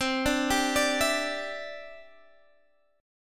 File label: D 7th Suspended 2nd Suspended 4th C